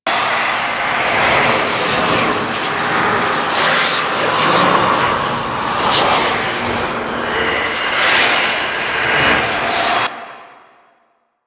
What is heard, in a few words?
Grimoire Pedestal Idle Loop; Warframe; Quests; Entrati Labs